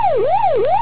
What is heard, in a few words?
siren